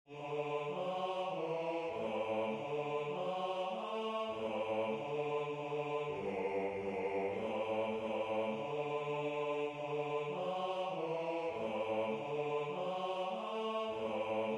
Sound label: Bass